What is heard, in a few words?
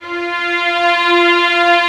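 Vio legato F3; Digital Sound Factory Virtuoso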